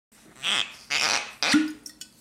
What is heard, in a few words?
drum